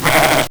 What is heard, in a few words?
LSDj Animals, Nintendo Gameboy; 300 Drum Machines